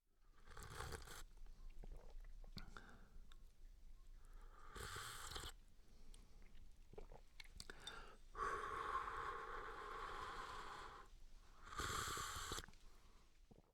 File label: sipping hot liquid